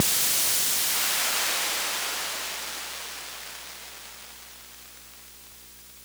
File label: torpedo; seawolf